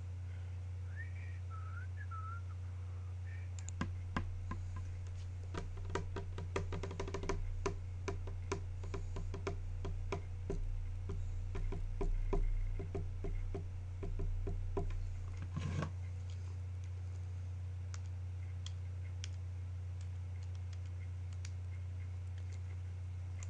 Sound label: random sounds